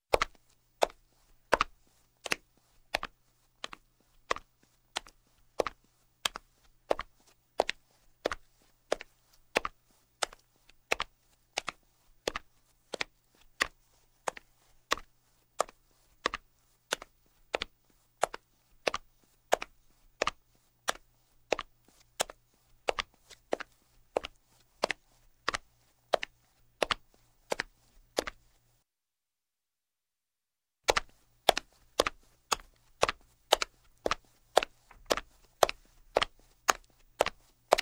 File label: Footsteps, Linoleum - Male; Sound Ideas 2000 Series